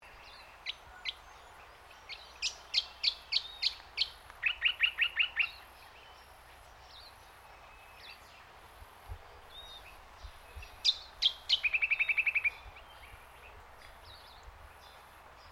AMBIENCE YANDINA BOTANIC GARDENS BIRDS, Botanic gardens in Yandina Queensland; Animals